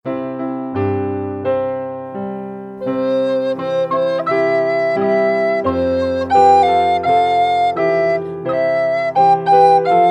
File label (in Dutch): Rijden maar 2e stem